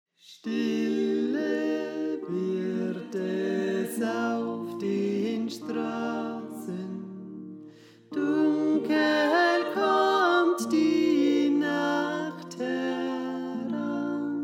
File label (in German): Tenor; Stille Wird Es Auf Den Straßen